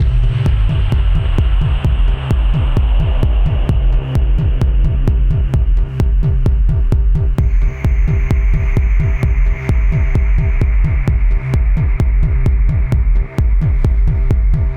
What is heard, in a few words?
Combat Loop; Megaton Rainfall; PS4